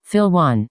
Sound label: Fill